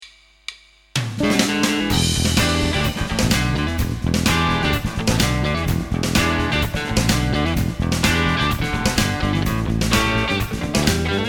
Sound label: Strat OD